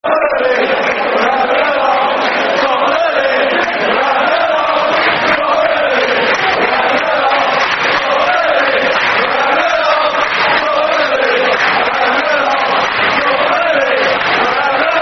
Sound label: Top Of The League, Football Chants (Soccer Song); Chelsea Cfc